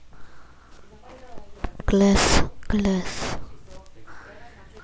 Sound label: glass